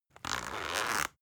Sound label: zipper soft